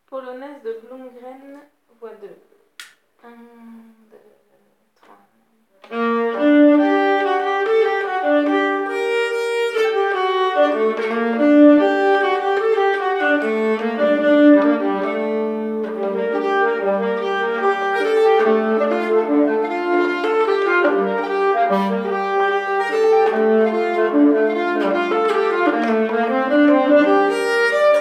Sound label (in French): polonas voix, nyckelharpa; octobresaintdie